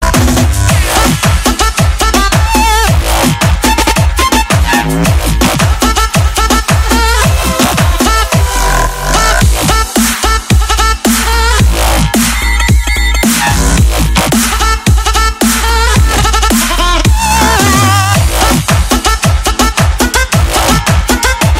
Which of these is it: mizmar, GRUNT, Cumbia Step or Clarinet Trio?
Cumbia Step